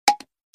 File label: bottle opener ringtone